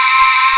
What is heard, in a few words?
BOTTLE VOX; SONIC ELEMNT; SONIC EL YES